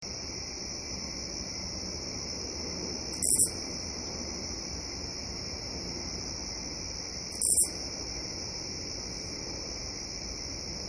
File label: Katydid